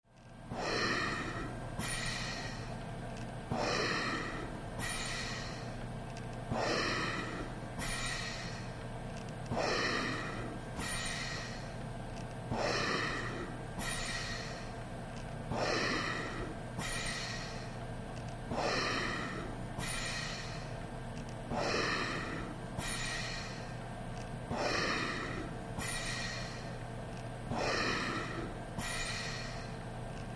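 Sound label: Respirator Breathing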